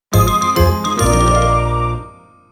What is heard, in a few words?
Fanfare; Pokémon Sleep; Pokemon Evolved!